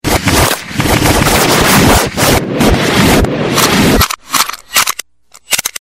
gun, reverse

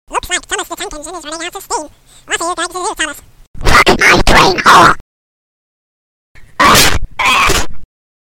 train hole

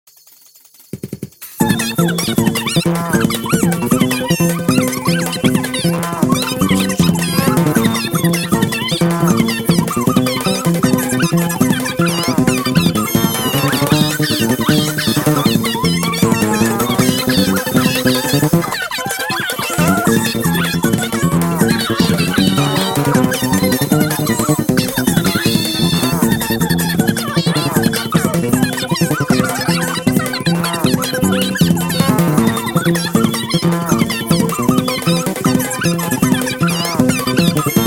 jingle hall

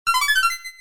pickup

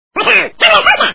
train closer

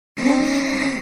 gasp

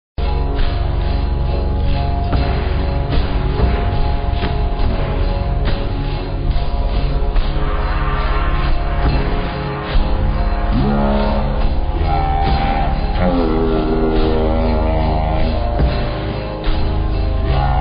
die

